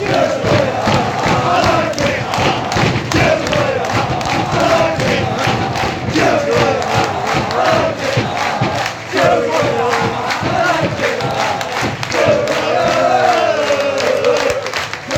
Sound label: gills away aha aha i like it, Gillingham football chants